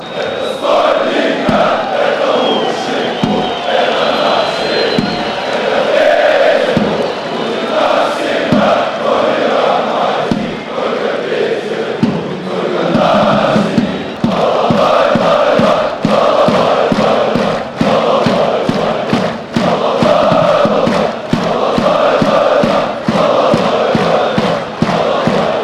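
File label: football chants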